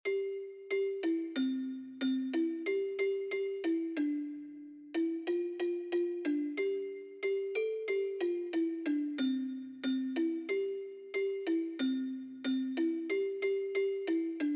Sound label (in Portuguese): Marcha Soldado Melodia